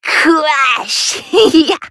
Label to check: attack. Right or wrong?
Right